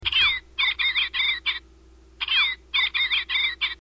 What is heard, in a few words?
Bluebird